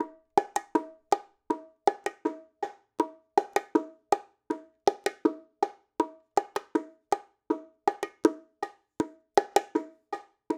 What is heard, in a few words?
latin bongo loop 80; perc construction loops; bongos latin; SEISMIC Drum Sample Pack; Slate